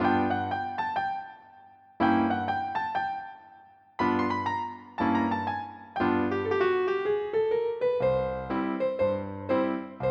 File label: jingle